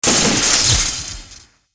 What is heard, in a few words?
console explosion